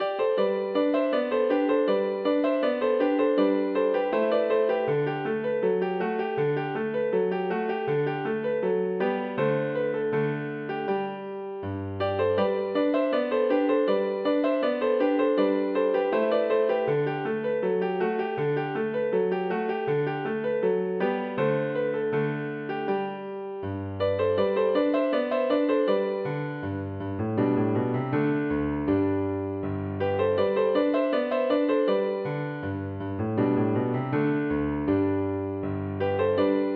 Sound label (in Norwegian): Marchs for piano; Bang